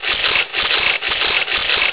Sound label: 35mm camera